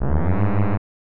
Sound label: Siren